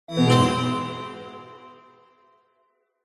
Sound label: multimedia game sound negative magical